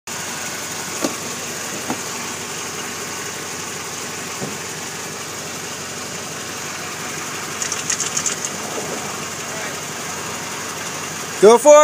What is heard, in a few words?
driveshaft rattle 2000 taco 2 7l unidentified horrid sound